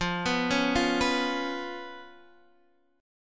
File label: C sharp 7th F, chord